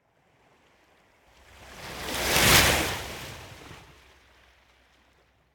Ocean Surf Water Lap On The Coast Surf Medium Faster Waves; Hollywood Edge; sound designer's toolkit v2